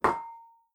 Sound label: Kitchen Dish Clank